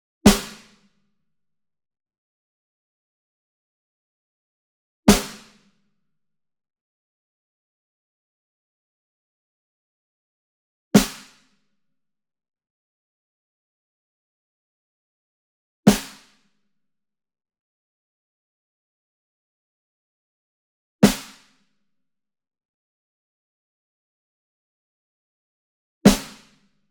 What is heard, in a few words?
SINGLE SNARE Pearl High Tension Hit Near; boom library; Cinematic Strikes Construction Kit